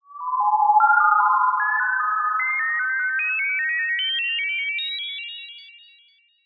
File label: sound design dreamy arpeggio beeps confused; zapsplat